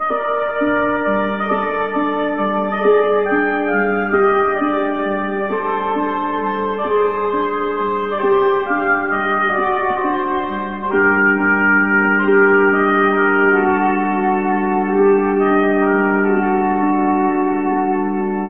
sax, sad

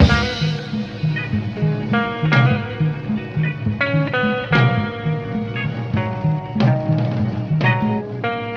guitar, Loops Phonky

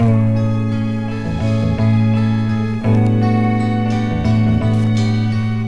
guitar, Loops

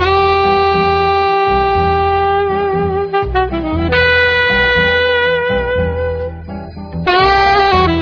sax, Loops; Phonky

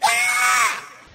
scream

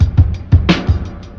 basic axles, Drum loops Boombap

